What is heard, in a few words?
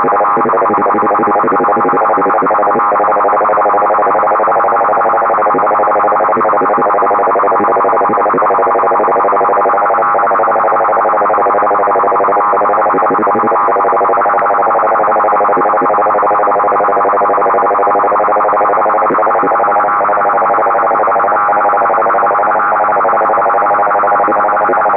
perturb 77 5KHz; dcf77 vlf; Perturbatii LF